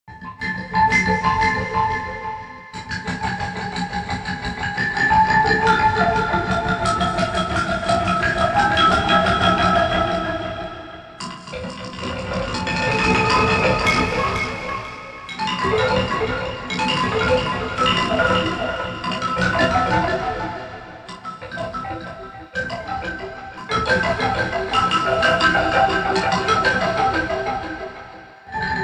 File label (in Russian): zvonok ksilofon haos